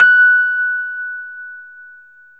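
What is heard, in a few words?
Rhodes, F#5, right; 3D Sound; 3D Keys VOL-1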